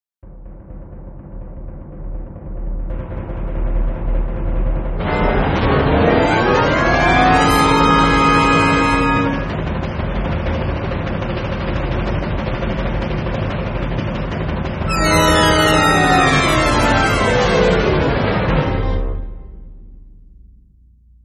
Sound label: Cues Narrator drop INST